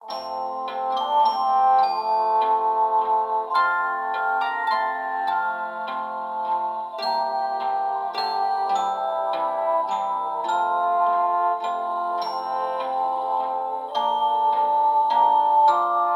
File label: Silent Night Radio; Modern Combat 5 Blackout